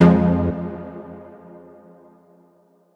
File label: stab hall F; stabs